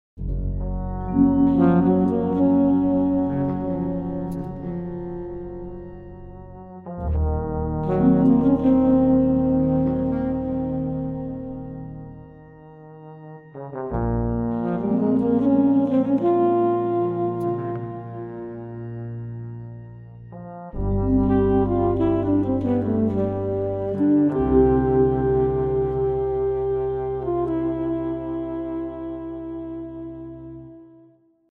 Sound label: romantic end